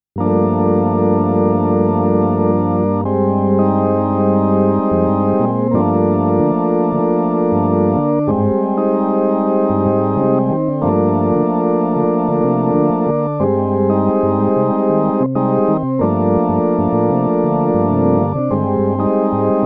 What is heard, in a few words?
Rotary Organ OB-Xa